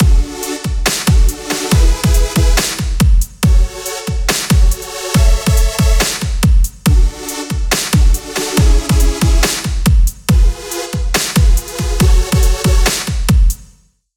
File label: sample song side chain compressor on